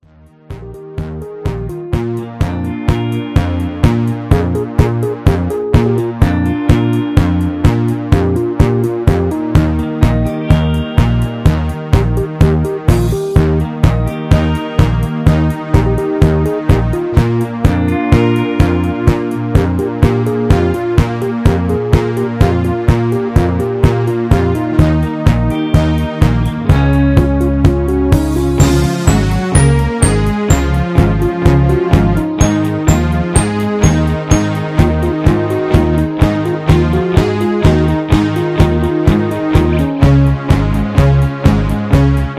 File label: Inst w Leads